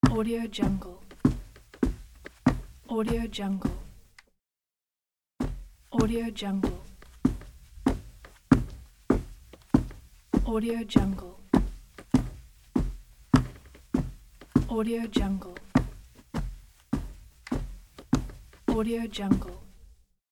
heavy footsteps on carpet walking

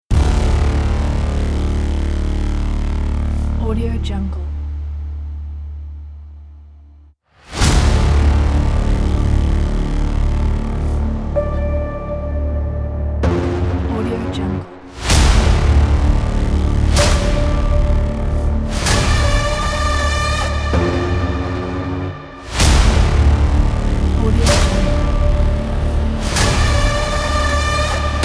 trailer smash

